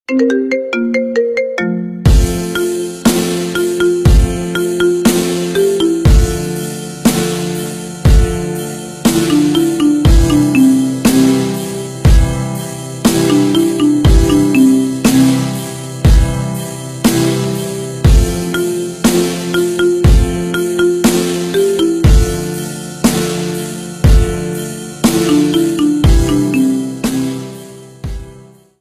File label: Marimba